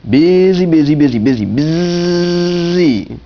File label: long Bee; Wayzata Technology; Garden